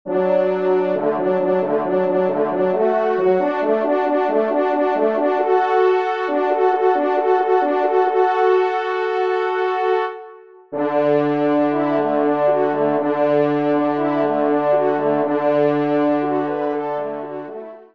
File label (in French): TRP; Guillaume Tell; Pupitre 3° Cor; Gustave Rochard